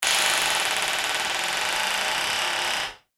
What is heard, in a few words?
household door squeak creak loud; Loud Door Creaking; Objects; McKinneySound